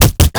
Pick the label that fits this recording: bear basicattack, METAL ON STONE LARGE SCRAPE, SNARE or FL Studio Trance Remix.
bear basicattack